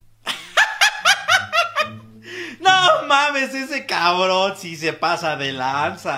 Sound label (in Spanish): risa